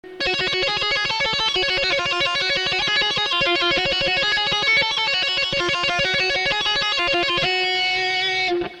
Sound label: tap